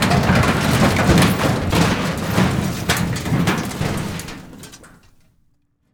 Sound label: metal debris, metal debris dumped in metal container